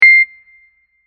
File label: beep; psychsim